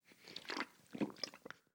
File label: beer; easter egg; netent